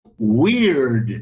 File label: weeeiiirrrddd